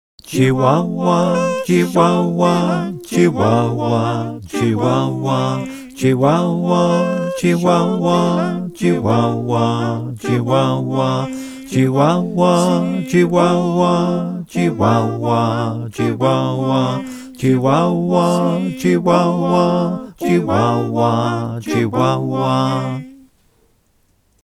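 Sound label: bass, choir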